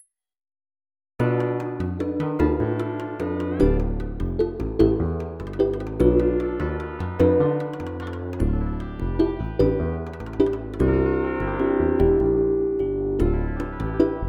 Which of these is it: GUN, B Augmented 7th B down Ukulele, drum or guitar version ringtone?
drum